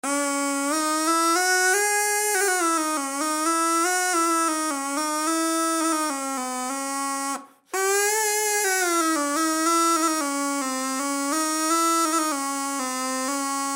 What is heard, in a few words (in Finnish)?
Mehupillioboe